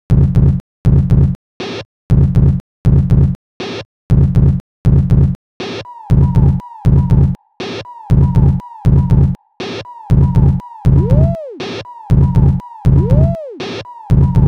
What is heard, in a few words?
Signwave